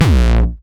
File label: 909 Grime Layer A#1, Kicks; Drums; Mbase 11 Kicks